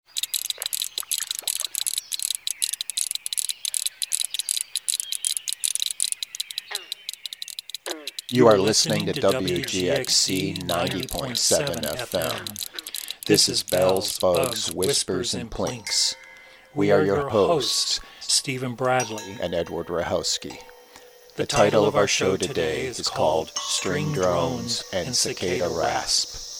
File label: String Drones Cicada Rasp; Bradley Ruchalski; Wave Farm